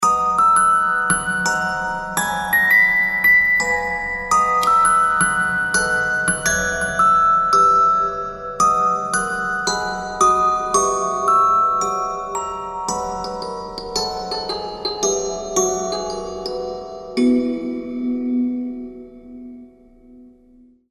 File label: Orgel; tree of savior